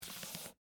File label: Bible Move Finger, On, Page, Book; Foley; Books; Offices; BlastWave